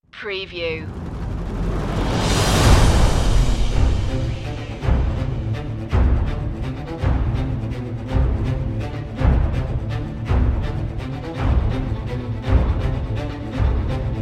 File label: majestic peak no brass